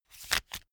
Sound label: pack of cards shuffle hand flutter; comedy-cartoon; spielzeug